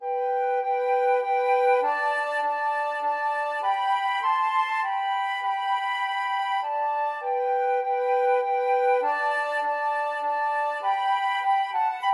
flute duet

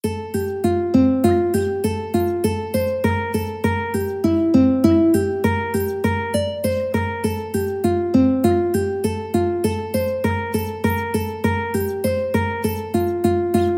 ukulele

